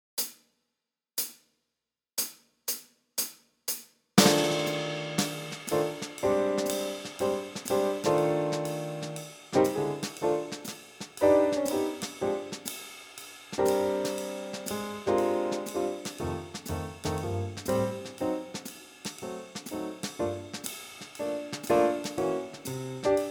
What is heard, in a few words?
blues; Etude 1 Backing Track